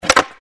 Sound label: jump skate; disneyxd; stuntastic